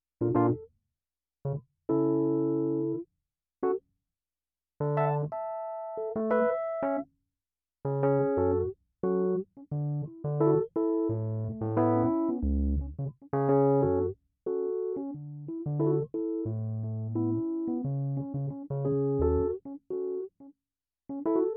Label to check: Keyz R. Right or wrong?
Right